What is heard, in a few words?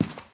Footstep Carpet; Phasmophobia